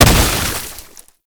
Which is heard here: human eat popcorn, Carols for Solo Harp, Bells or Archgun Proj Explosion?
Archgun Proj Explosion